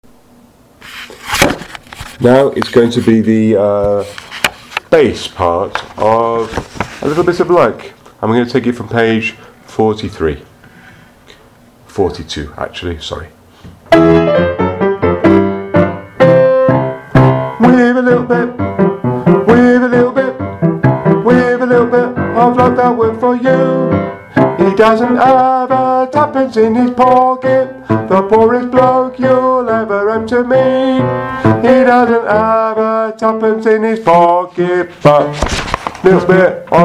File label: bass